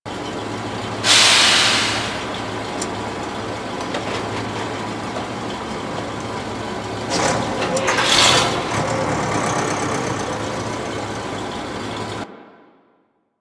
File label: Truck Idle Loop; Ambience; Props; Warframe; Nineteen Ninety Nine